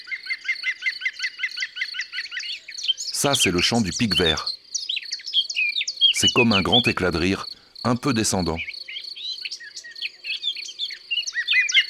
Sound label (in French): Pic vert; abc de la nature